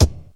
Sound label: nineties original kick drum e key, kick drum sound tuned to the E note; kick drum samples